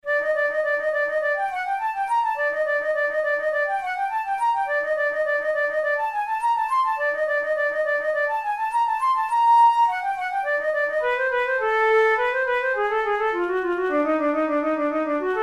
methode pour la flute study; drouet

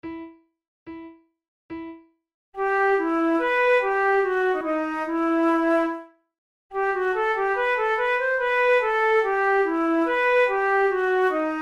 flute

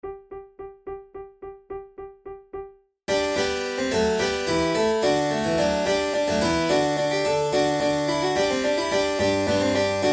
courante, flute; praetorius; terpsichore no28

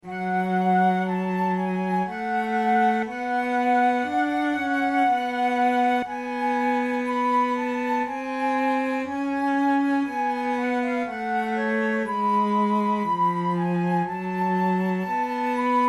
adagio, flute

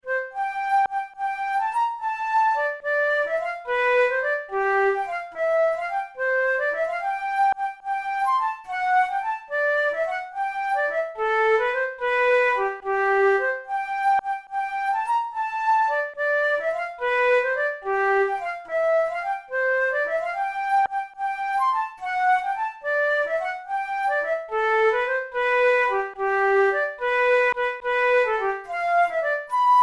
forlane, flute

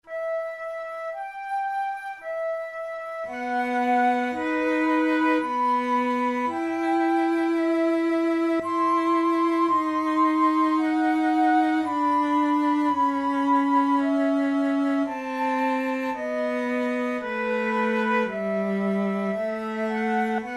flute sonata in e minor adagio; braun

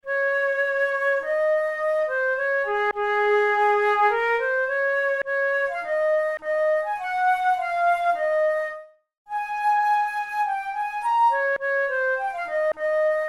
flute studies in d flat major; dothel

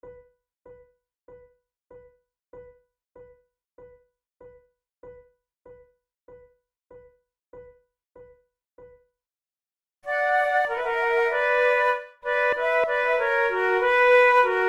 sonate en trio, rondeau, flute

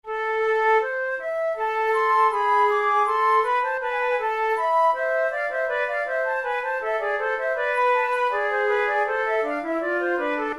prelude, flute

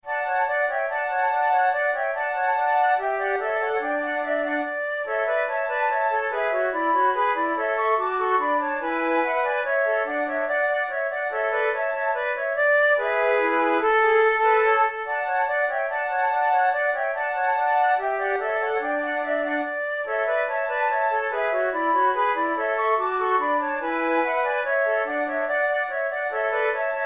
flute trio menuett; quantz

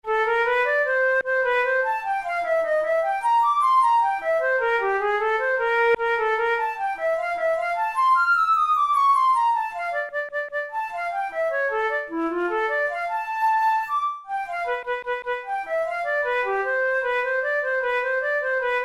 study in f major, flute tunes; kohler op33 no1 study no3

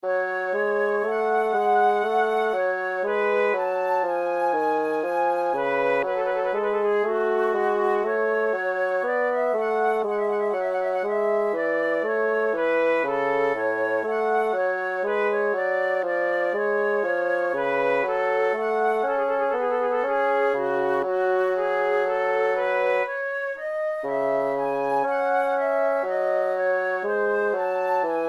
larghetto, flute; ranish

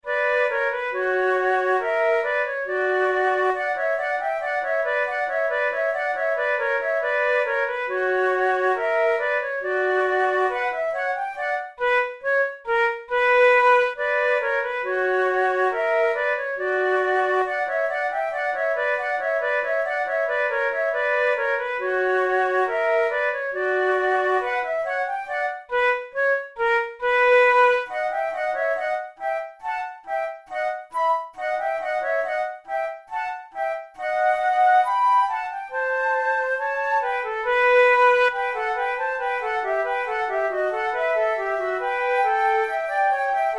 rondeau duet, flute

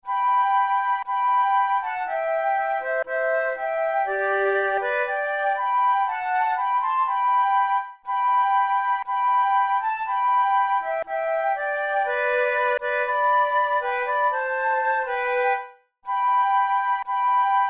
duet, flute tunes; Oft in the stilly night

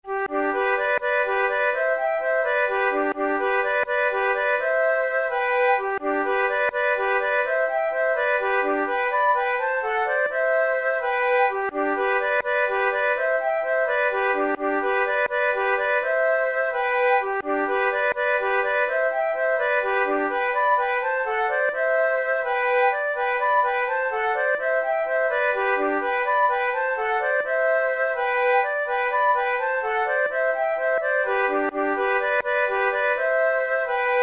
duet; Over the water to Charlie

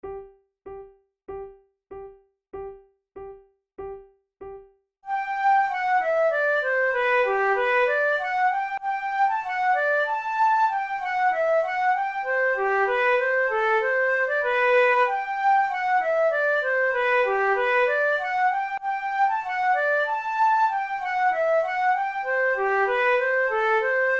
duet

